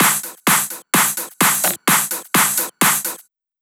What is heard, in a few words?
Fills 128BPM, Clubsounds; Vengeance - Essential Clubsounds Vol.5